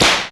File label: WHIP CRAK; Back In Time Records; Big Bang; THE TECH